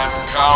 car horn

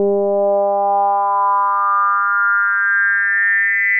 fof sweep

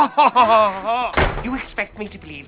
female laugh

